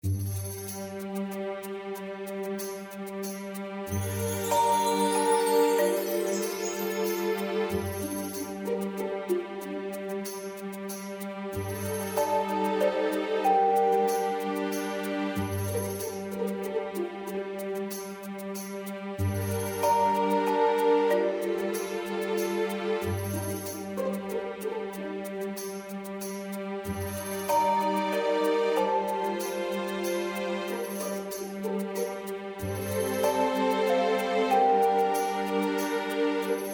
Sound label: bgm